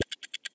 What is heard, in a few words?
ten seconds left